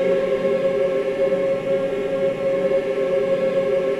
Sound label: AVANTE G, Voices